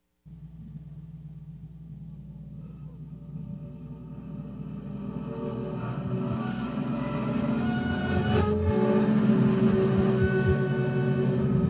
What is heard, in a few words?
backwards acoustic guitar and udu echo